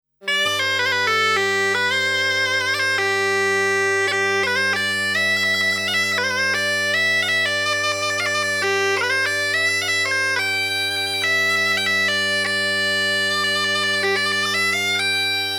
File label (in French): Air de noces; Air de noces des Gavinet